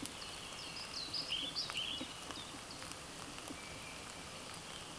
Ficedula hypoleuca

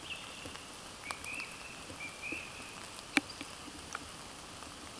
Anas crecca

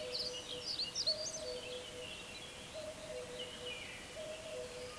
Prunella modularis

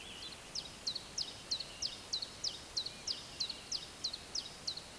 Phylloscopus collybita

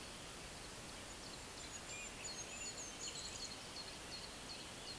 Regulus regulus

